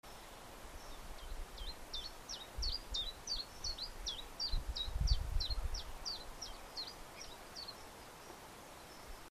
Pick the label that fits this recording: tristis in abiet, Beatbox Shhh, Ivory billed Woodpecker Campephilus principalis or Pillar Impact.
tristis in abiet